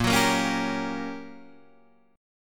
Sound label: G Minor Major 7th Flat 5th Bb